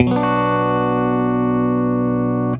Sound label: B MINOR, Funk Gtr & Wah; PlatinumPhattCD2; E-MU Producer Series Vol. 8 – Platinum Phatt